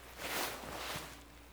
Foley Turn; Operator; Warframe; The War Within; Queens Chamber Scene Eleven; Cinematics

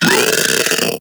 Infested Splitter Split Top Vocal Attack Medium

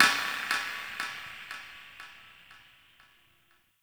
Ship Metal Knock; Warframe; Corpus Ship Remaster

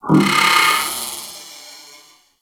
Reactor Core Small Stop, Ambience; Warframe; Orokin

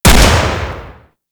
Double Barrel Shotgun Shot; Warframe; Weapons; Tenno

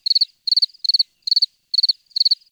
Tree Cricket; Ambience; Warframe; Nineteen Ninety Nine

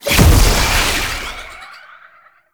Jump Pad Launch; Warframe; Shadowgrapher; Ambience

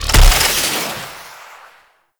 Jump Slam Start; Warframe; Nechro Mech; Abilities

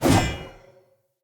Sphere Polearm Whoosh; Weapons; Player

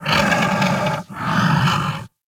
Kubrow Feral Vocal Breath Walk; Enemies; Warframe

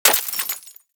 Bullet Impact Debris Glass; Warframe; Weapons; Bullet Impacts

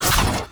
Whoosh, Glaive; Glaive Ghost Catcher; Warframe; Weapons

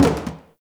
Plastic Hit Short

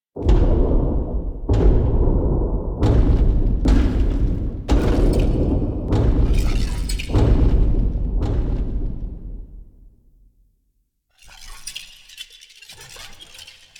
Movement D; The New War; Erra; Cinematics

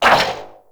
Corpus Struggle; Warframe; Amalgam Override

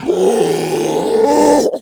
Infested Ancient Strike Scream; Warframe

